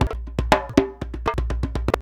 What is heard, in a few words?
119 DJEMBE, Modern Percussion Loops